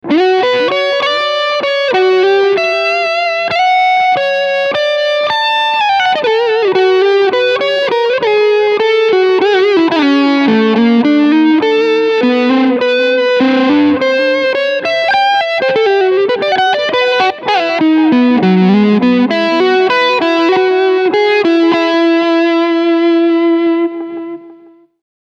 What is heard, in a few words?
Medium Gain Delay